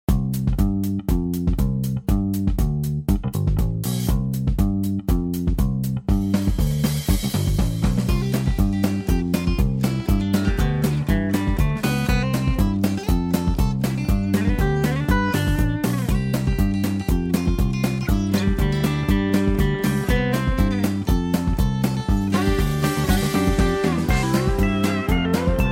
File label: harmony sample